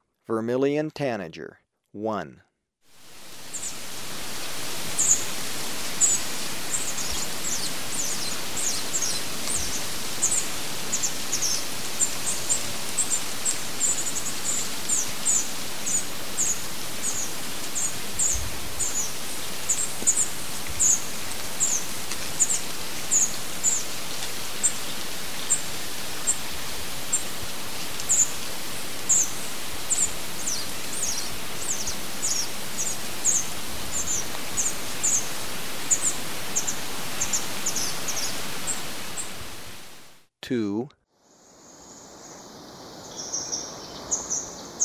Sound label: Vermilion Tanager, Birds of Ecuador; S. America